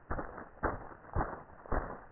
deep footsteps; Five Nights at Freddy's; FNAF 4

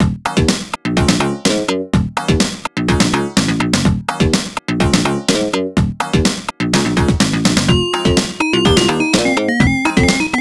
Race BGM

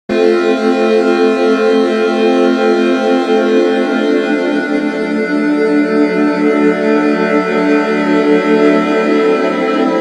Music Box; don t starve together; Hutch

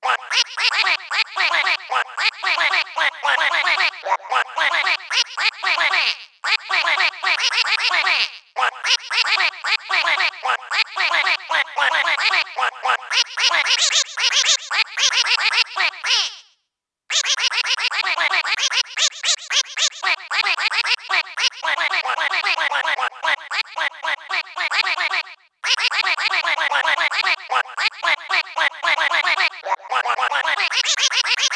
Birds' Song

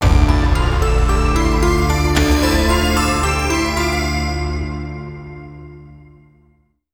Zombie Wave Start

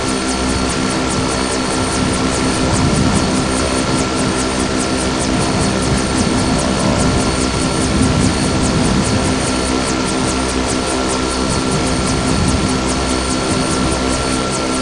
Ambient Exterior; Among Us

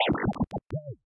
Panel Reactor Start Fail; Among Us

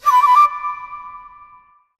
Focus Complete Jingle

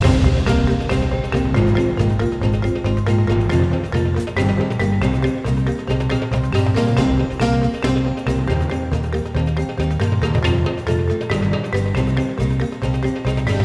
Cyclops Square; Skylanders Giants

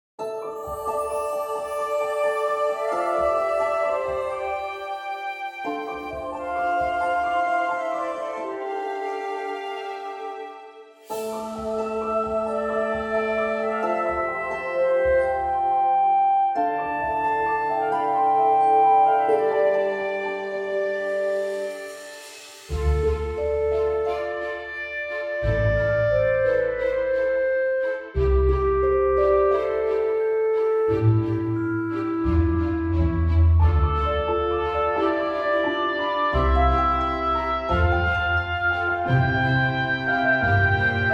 Bgm Ambience; Alchemy Garden; Windows

